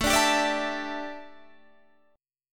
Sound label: D Suspended 2nd Suspended 4th A